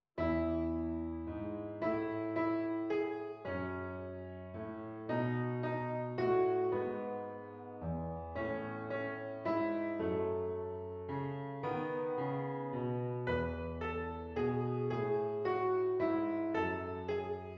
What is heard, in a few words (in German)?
Klaviersatz einfach; Wo immer im Streit; Cramer